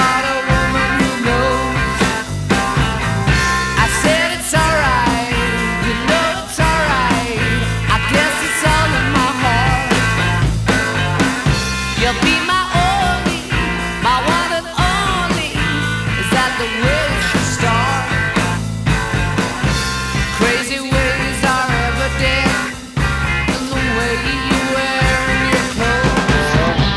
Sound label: rock